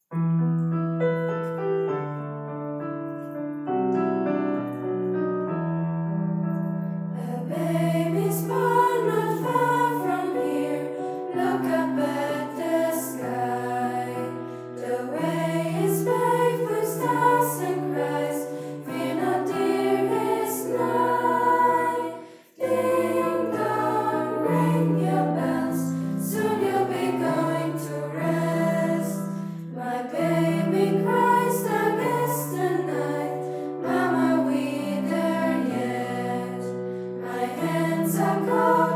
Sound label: Christmas Lullaby